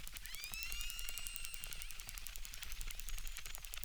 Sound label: Chirp, acquarium